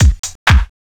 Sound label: Dance Drumloop; Drums; Dance Beats